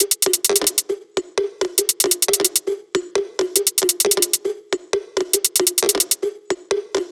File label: hats 135, Hat Loops; drum sample pack; SEISMIC; Slate